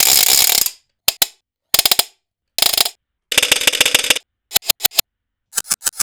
wood rattles

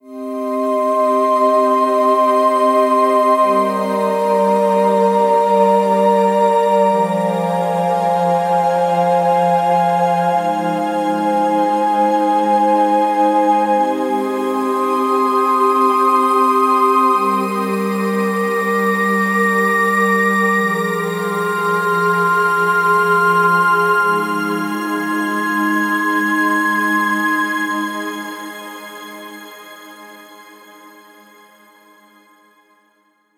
Warm Synth Pad Cm 70BPM; STEMS; Ghosthack; SkyRealm; Mood Kits; Mood Kit 09 - Washed Ashore

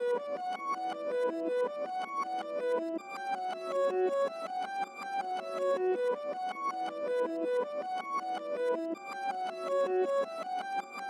161 BPM B Min Reverse Piano; Melody; Loop Stems; Cymatics; 2022 Melody Collection; Third Eye

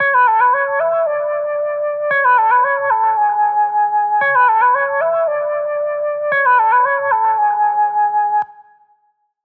114 BPM F# Min Lead; Trap Melody Loops; Loop Stems; Cymatics; Nightmares; Fireflies

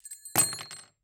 Metal Ching Debris Nail; Fairlight Sound Lib

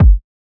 Kick - G#, Hip Hop; Drums - One Shots; Cymatics - 9God Hip Hop Sample Pack

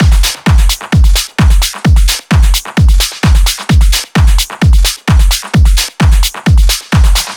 Drum Loop 130, Techno

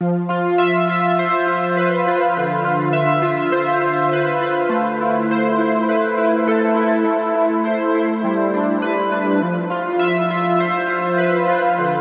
102 BPM A Min OB-X Pluck, Synth; Cymatics; Fantasy Synth Sample Pack; Final Destination

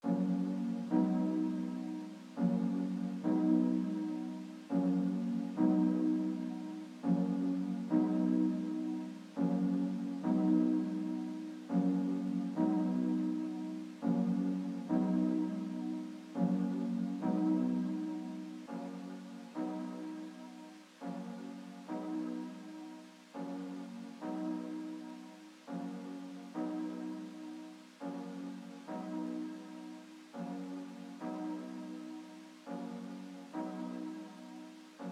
103 BPM F# Maj Pad, Afrobeats; Melody; Loop Stems; Cymatics; Bahamas

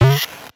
Retro FX; Cymatics; Video Game Sample Pack Vol 1

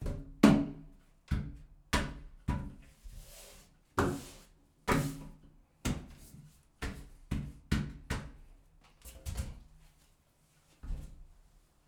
Empty Bathtub Hits Bumps; Fairlight Sound Lib

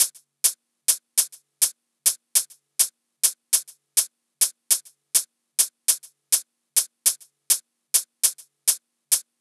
Hihat Loop 102 BPM; Figured; Hip Hop

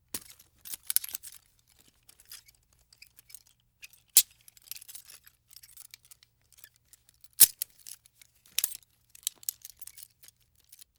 Handcuff Movement; Fairlight Sound Lib

